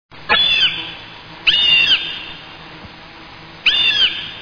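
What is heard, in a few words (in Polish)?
jastrząb gołębiarz, głosy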